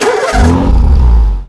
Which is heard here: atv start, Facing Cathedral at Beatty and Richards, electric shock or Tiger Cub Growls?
atv start